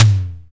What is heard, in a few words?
SDS5 AMB TOM A; Greytsounds VOL-1; SDX TOMS